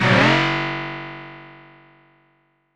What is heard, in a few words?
Dance Mega Synth; Best Service; 90 s Sample CDs